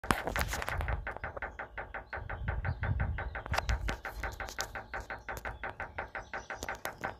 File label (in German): Klackern Doppeltankanlage